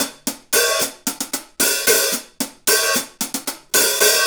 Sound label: Hi Hat Pattern; Percussion; Essential Percussion; Hi Hat Patterns